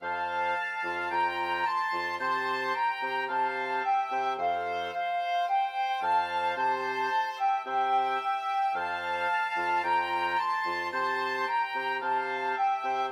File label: flexible mixed ensemble, 4 players